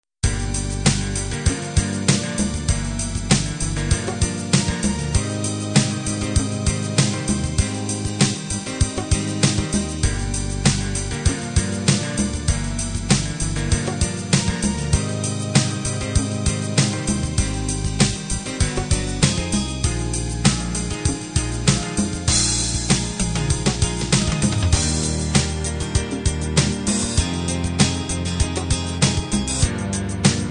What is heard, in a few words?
home beat